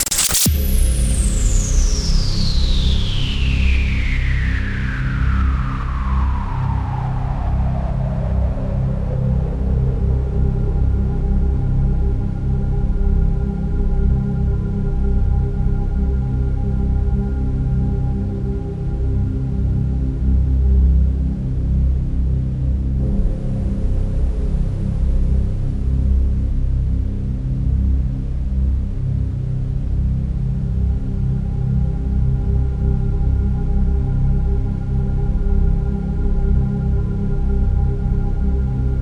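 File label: drone, 64 bpm, C major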